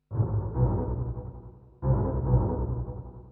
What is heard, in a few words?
TEKNO 140; Techno2